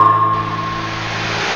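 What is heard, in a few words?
synth, Production Effects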